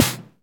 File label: Claps & Snares